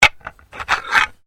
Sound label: Bones Human Move